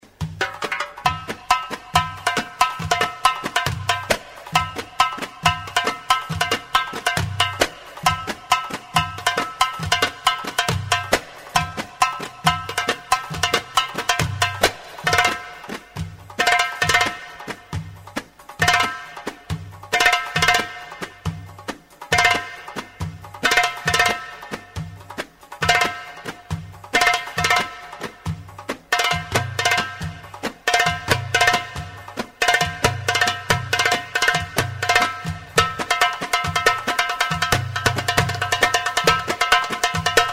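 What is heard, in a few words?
African drums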